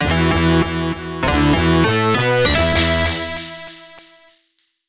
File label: Stage Clear; Crossing Shadow; Lightning Swords; Irem M84